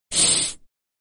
Sniffing

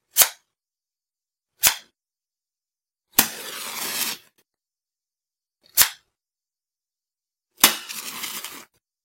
match lighting on

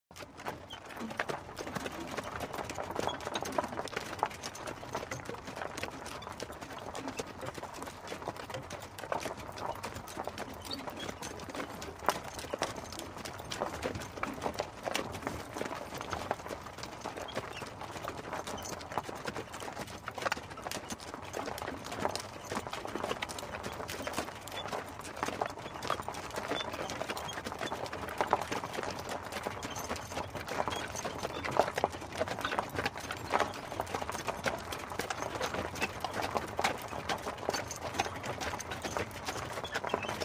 horse and carriage sfx

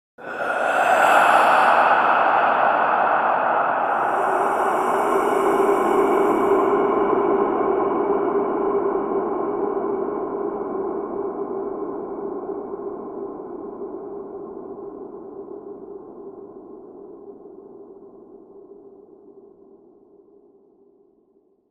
jin sound, creepy

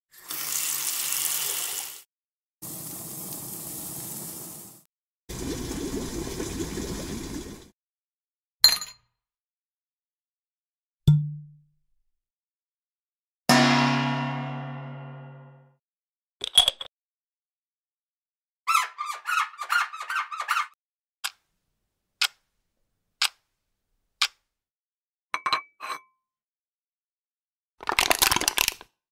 house sound effect

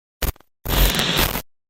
glitch

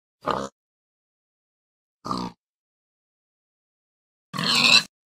Pig sound minecraft; صدای خوک

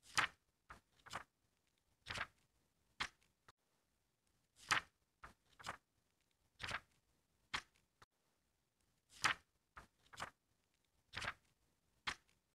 flipping pages sound, book

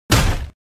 Kick Sound; human

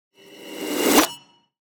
Tiny Riser

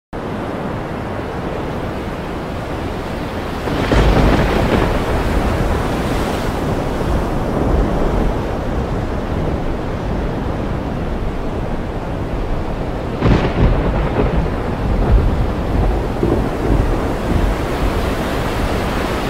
storm ocean

